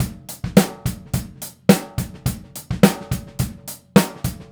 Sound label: Straight Kit, World Pop Beats; Sonic Foundry (Sony Creative Software)